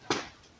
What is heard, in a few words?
foot steps; Walking; fmod-moloch